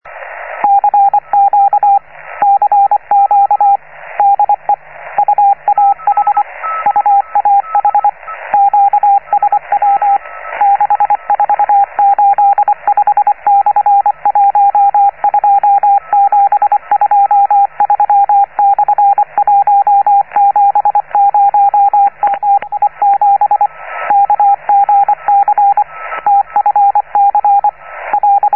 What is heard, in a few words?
cw; tallinn radio 'uah'; a telegraph recording from 1987, with the wellknown callsign 'UAH'; Tallinn Radio (ESA/UAH); rainer brannolte, d